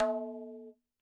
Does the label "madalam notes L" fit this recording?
Yes